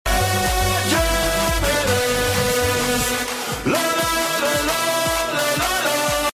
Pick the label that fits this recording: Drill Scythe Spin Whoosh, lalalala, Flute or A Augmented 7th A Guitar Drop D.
lalalala